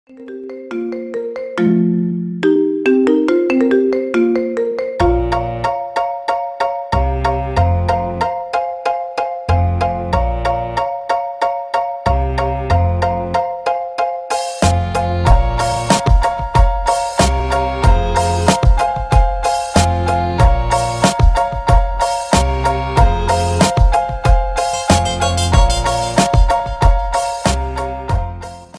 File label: marimba ringtone remix